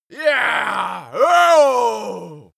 male victory evil

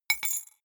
key ring small drop stone soft

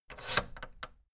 fader plastic scrape double down fast

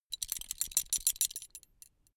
metal belt buckle clatter long heavy

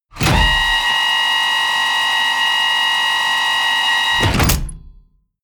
tank medium turret rotate

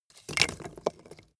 wood logs small fall

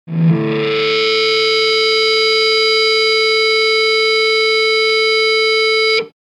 feedback

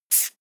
marker line short heavy

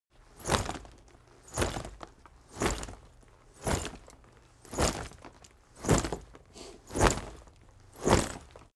leather armor movement sequence

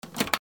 mechanical switch

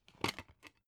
dvd case remove dvd

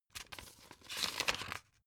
old flip page

